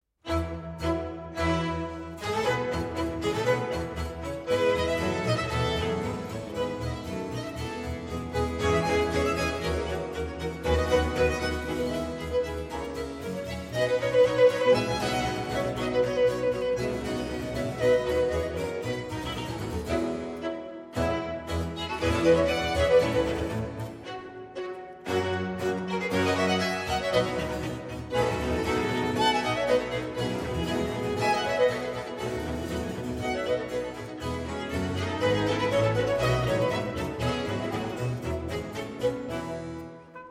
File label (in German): F-Dur 2. Satz